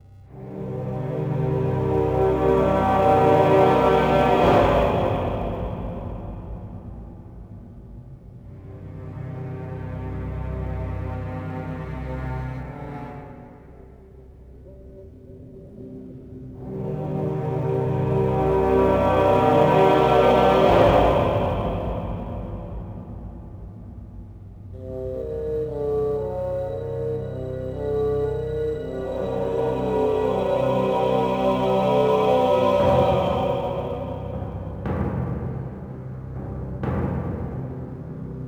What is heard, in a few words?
music; The Legend Of Spyro A New Beginning; XBOX; cynders lair